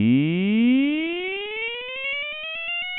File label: soprano; Synthetic demo